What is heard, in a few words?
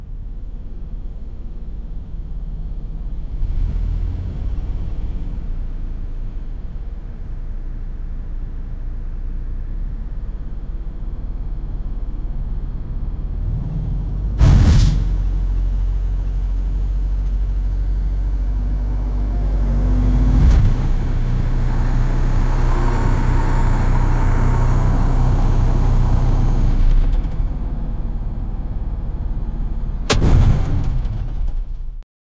i wanna car sound mix